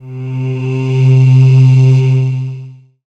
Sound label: pad, Dance Synths; Break Pads